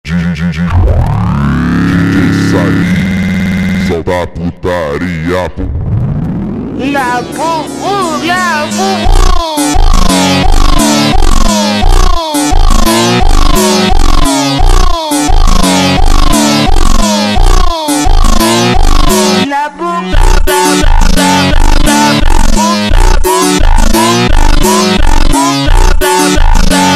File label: super slowed